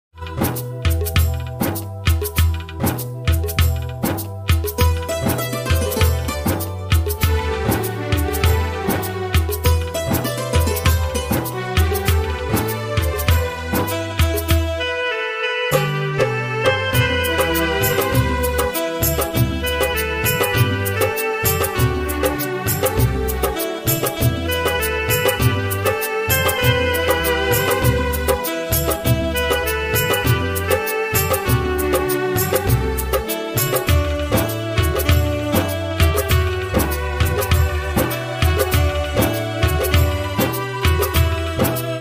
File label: old 90s songs ringtone hindi ringtone instrumental ringtone flute